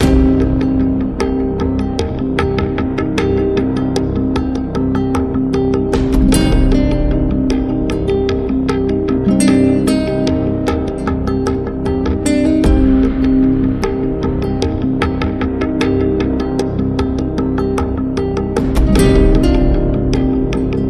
Cinematic Evolution, Loop

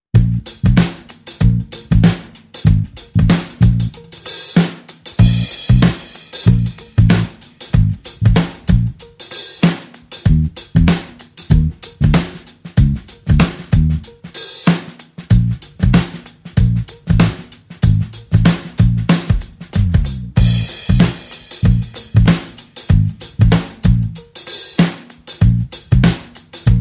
Sneaky Funk, Drums and Bass Only

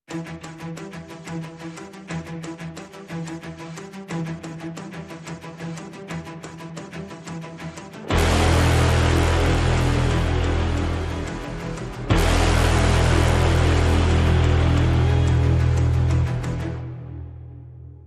No Perc No FX

